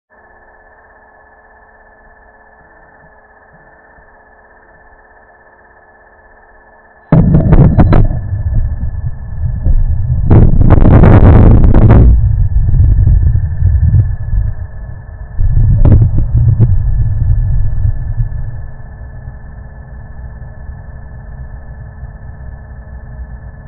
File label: rumble